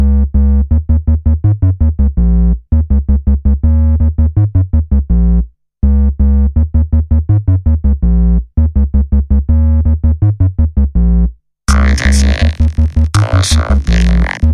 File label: bass